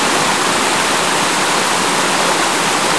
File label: waterfall